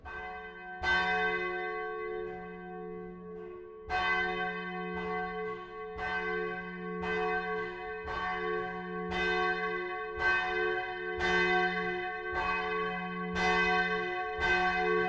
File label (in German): Glocke III; st. paul furth